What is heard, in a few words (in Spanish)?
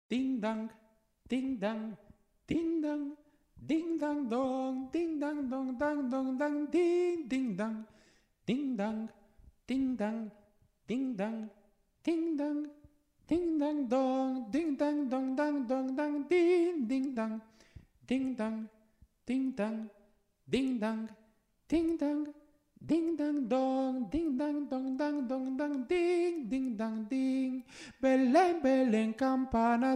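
tenor; Campana sobre campana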